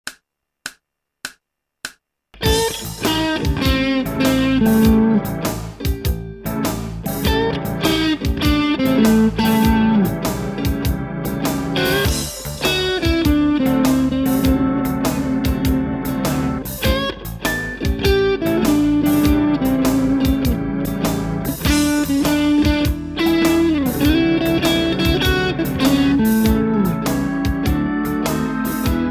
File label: Blues Etude